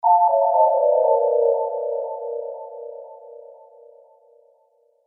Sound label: Scifi Game Fail; Video Game Sound Effects